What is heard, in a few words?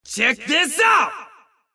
attack; Bad Brawler